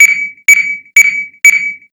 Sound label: beat warn